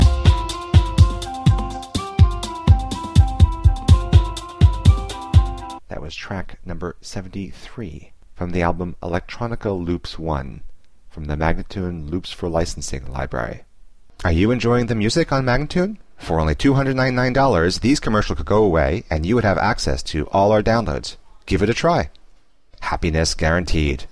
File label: 124 F spoken; Electronica Loops 1